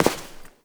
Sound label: Step; Warframe; Koumei